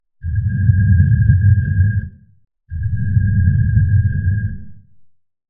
Sliding Stone Slab Simulation

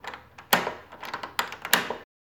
Unlocking Door

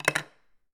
Hit Wooden Spoon Dropped Onto Counter

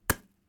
Arrow Impact

